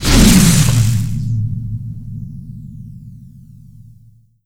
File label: tardis, flight broken start; p00gie